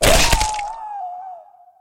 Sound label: neck snap; scp-173